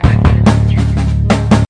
142 choppin butter bass; SAMPLED MUSIC LOOPS

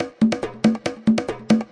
140 bpm, peppy bongo, drum loops and breaks

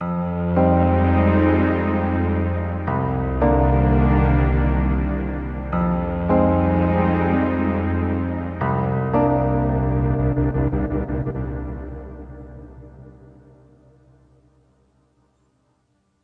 string piano, DnB song

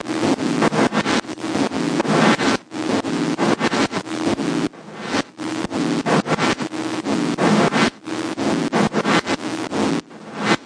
090 Noise Found Percussion; 074 to 128 bpm

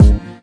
thick punch; Punches Hits Discoblasts; single hits

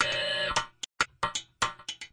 wavesqueal, 113 bpm, drum loops and breaks

